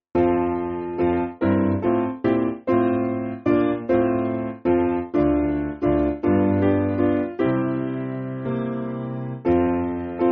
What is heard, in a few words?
Piano, Free Music Backing Files for Churches; Derwent